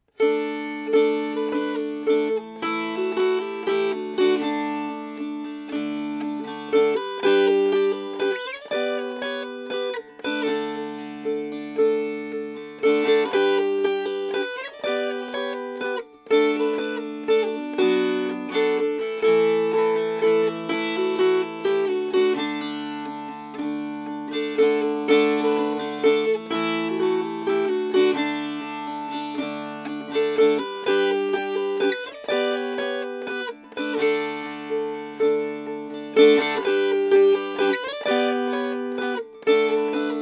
Strummed; My Sweethearts a Mule in the Mines

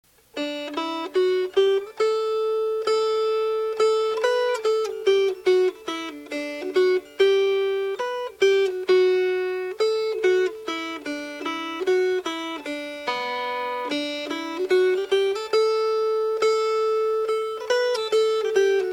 Melody

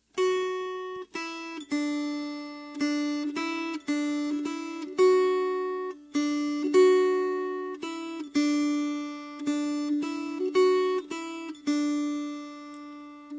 Melody; Fais Dodo

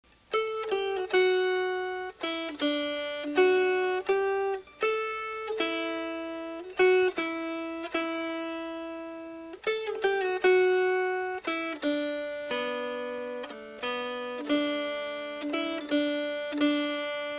Southwind Melody

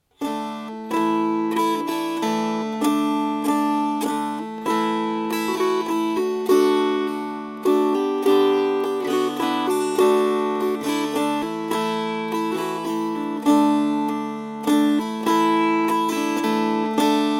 Strummed

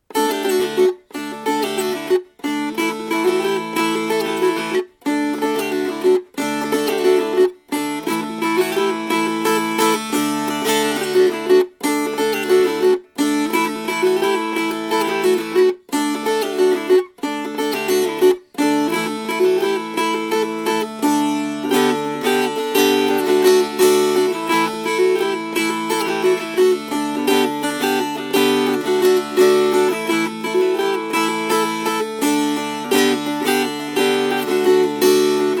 Suomi Fast

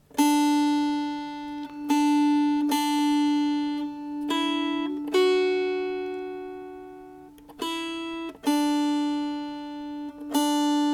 Melody, Dulcimer

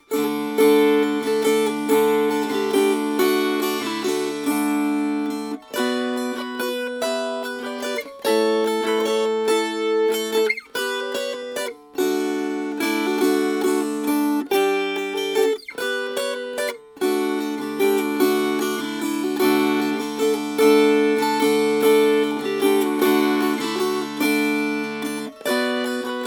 Strummed

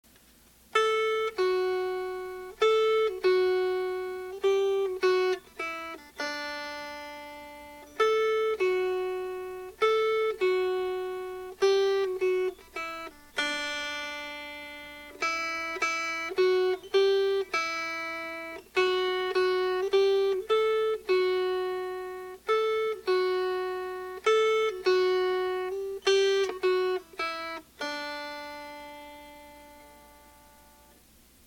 Melody; Lover's Cuckoo

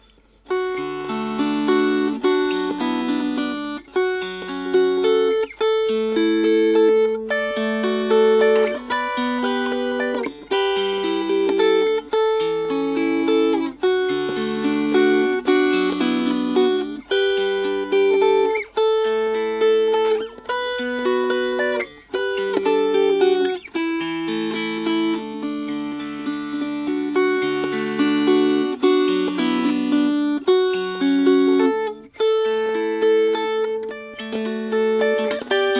Fingerpicked; Morag of Dunvegan

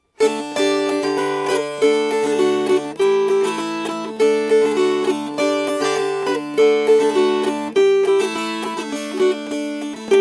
Fast

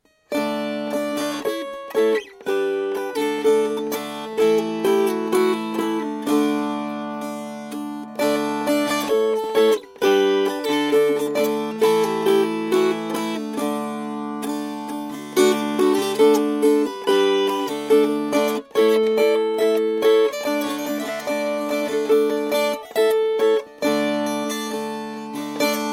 Strummed; Fair Rosalind

